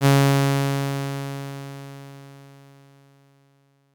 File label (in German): Saite d Oktav 415Hz, Leise Lauten; Stimmton: d